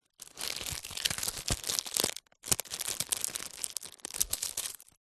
unopened greetings card in cellophane wrapper handling